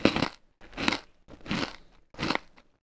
eat

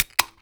can

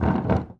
chair

